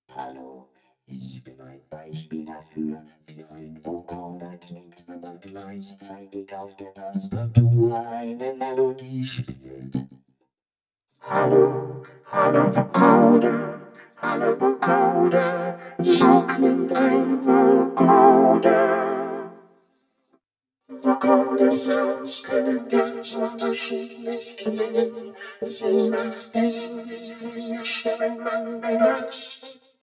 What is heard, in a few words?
Vocoder microKorg